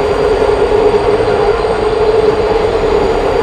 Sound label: elevator depart; Portal 2